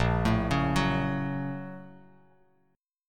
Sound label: A sharp Minor Major 7th Sharp 5th A sharp Bass Standard; A#mM7#5 arpeggiated; JGuitar